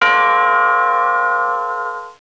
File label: Dong; Princess and the Pea; SoftKey